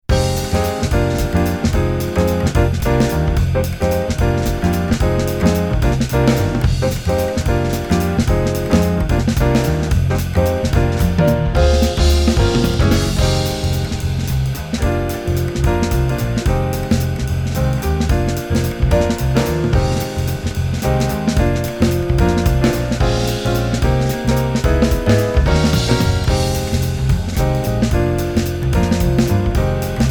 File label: Jazz Waltz Chord Bass